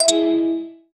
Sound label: ui sound to deselect a; mythology